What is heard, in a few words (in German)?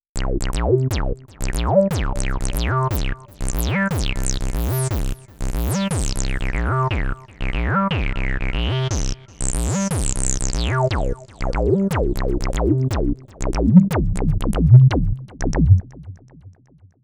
Digitakt Groovebox und Sampler fette Acid Bassline mit Multimode Legato und Portamento